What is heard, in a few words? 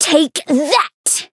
Voice attack